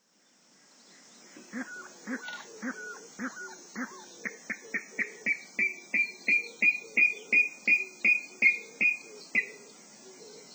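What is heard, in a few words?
kuina, Water Rail; watarase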